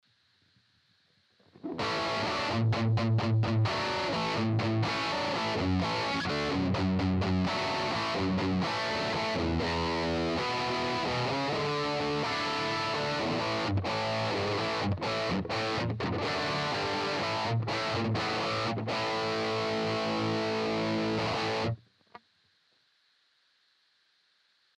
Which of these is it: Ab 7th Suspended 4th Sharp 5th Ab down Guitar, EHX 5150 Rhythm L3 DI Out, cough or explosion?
EHX 5150 Rhythm L3 DI Out